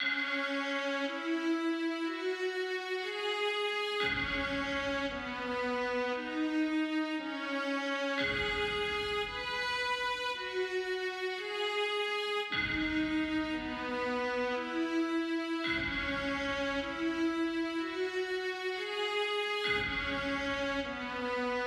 Peaceful Forest

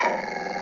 dog growl

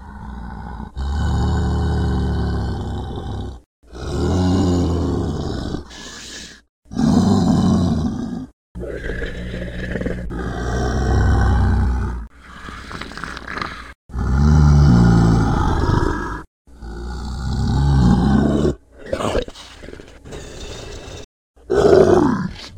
troll idle noises; Big scary troll

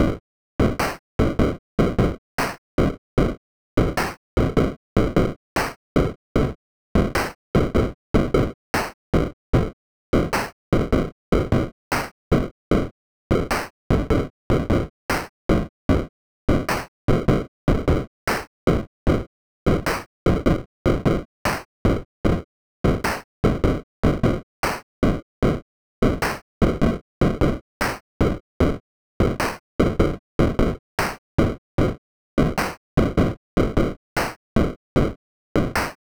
basic beat; Stranger Of You

